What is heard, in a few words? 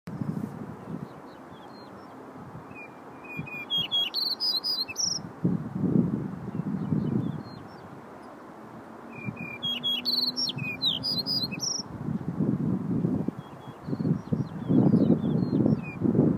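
yellow-breasted bunting voice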